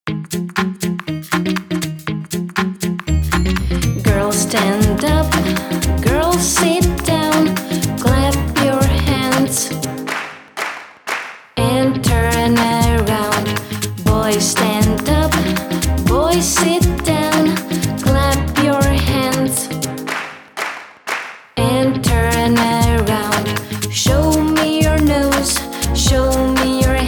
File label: Count to Ten; canzoni bambini inglese